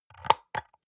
soap dispenser press almost empty; haus-garten; badezimmer

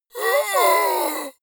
monster gasp; kreaturen

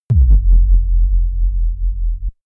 tension pump; whooshes rises

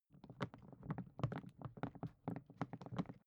briefcase plastic walking movement; industrie wirtschaft; bueroarbeit

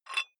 dumbbell thread take off small weight quick; sport freizeit

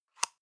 paper box small close medium; objekte

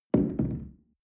shell place on tablecloth wobble medium short; berufe; gastronomie; soundpool

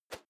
whoosh feather; swooshes-swishes

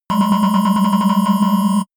shot ringing wobble chirp

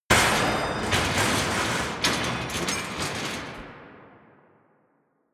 trash rattle

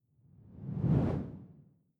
whoosh simple incoming low; swooshes-swishes

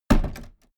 train toilet door close

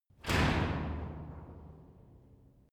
garage door shut

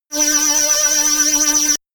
shot buzzy annoying tooth, zaps buzzes

